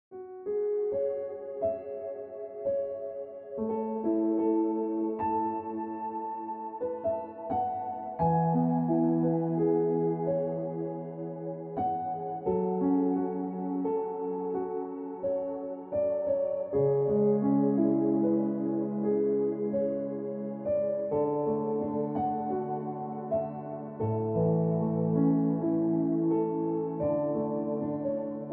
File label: Piano Music